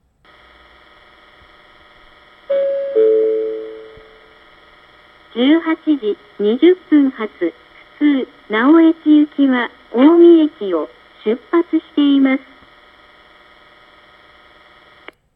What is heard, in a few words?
local naoetsu, itoigawa station; echigo-tokimeki-railway